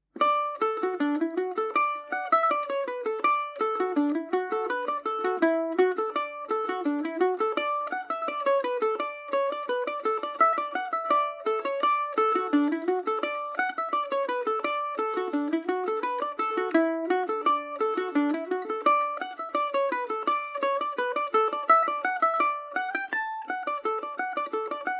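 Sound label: D major, mandolin; Paris Swing Macaferri mandolin; The Catherine Wheel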